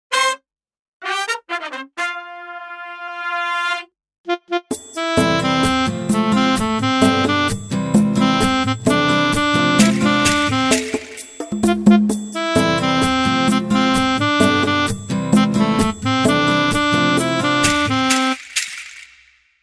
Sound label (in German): Jingle Projekt; steinhundert